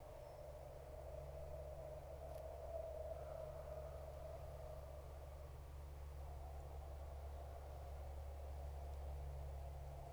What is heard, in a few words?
atmos; a sonic dance performance